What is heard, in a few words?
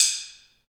ambient stix, Afro wood